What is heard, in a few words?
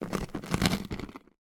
Bread, Curst, Bite, Mouth, Close, Chew, Crunch, Various; Mattia Cellotto; Crunch Mode